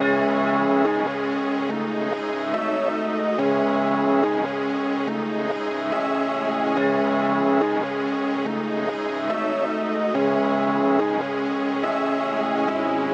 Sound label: 142 BPM C# Min, Drill; Consequences